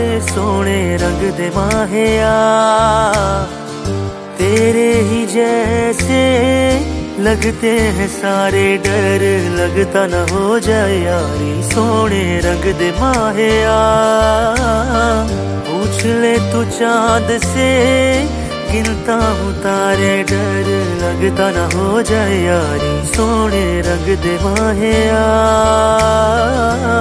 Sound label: Hindi Song Ringtone